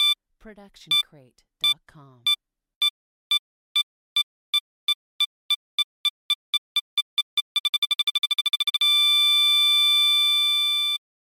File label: Bomb Beeps Increasing